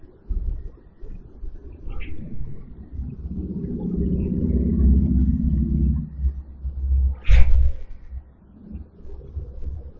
Static

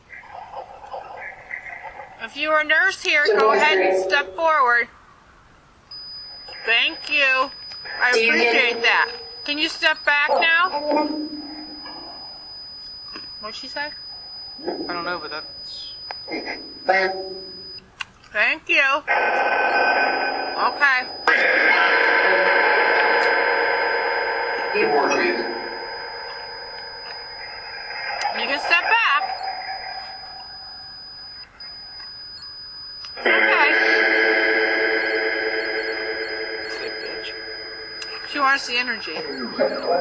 Open Field Phasma; martinsburg roundhouse investigation

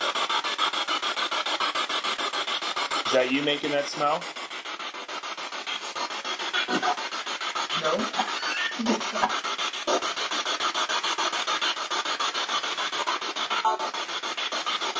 Spirit Box; Basement; wildwood sanitarium investigation

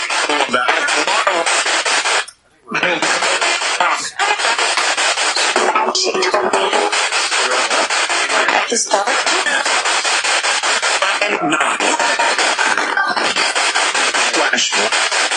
Spirit Box; Attic Small Room

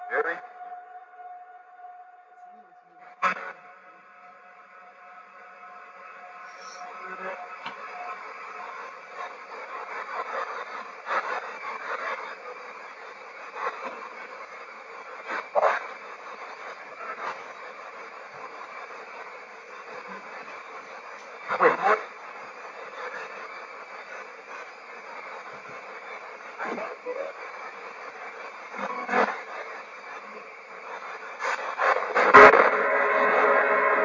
Library Spirit Box; wexford general store antique shop return investigation

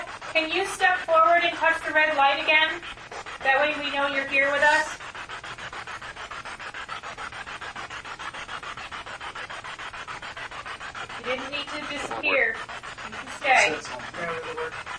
Basement Spirit Box; gill house investigation

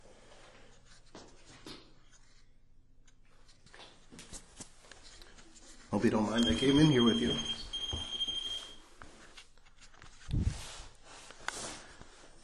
EVP Bathroom

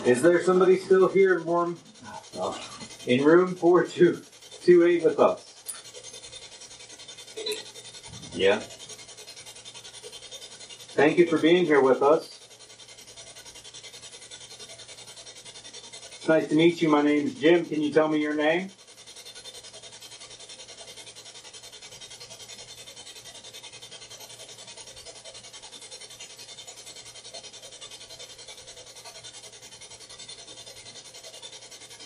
Room 428 Spirit Box

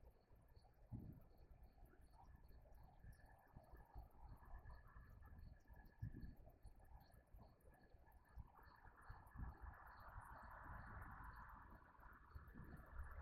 Townsend Room Static; Irish-Townsend House – Investigation

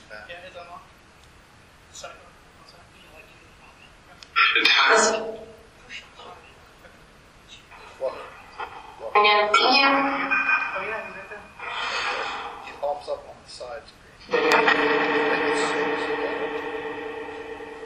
Phasma Box; beaver area high school investigation

